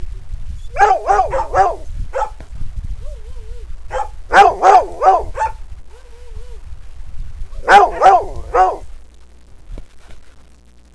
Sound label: barking; zekie